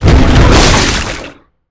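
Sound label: attack; grell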